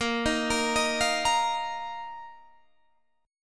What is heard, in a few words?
Bb Suspended 4th Bb down Guitar Standard, Bbsus4 chord; Bbsus4 arpeggiated